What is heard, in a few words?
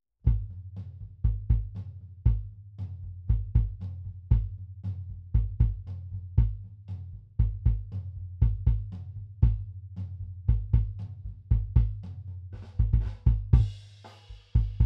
Kick